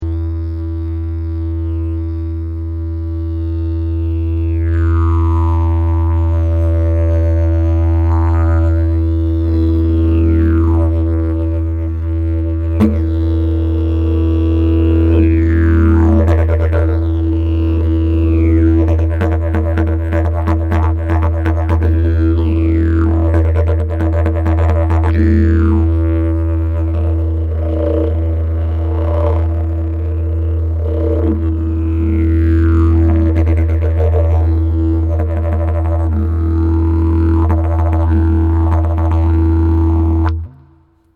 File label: didge